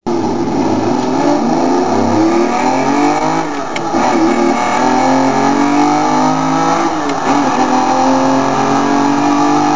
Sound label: lamborghini diablo